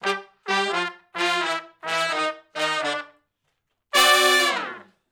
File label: Sh Bls Climb Down to V (G) uni, har, Horncraft for R&B; Crimson Blue and Fabulous Horncraft 4 RnB; Sections; Shf Blus Climb Down to V